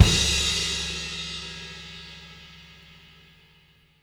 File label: crash; Zero-G; Monster Beats; Single Hits